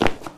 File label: tile fall; Golden Crayon Sounds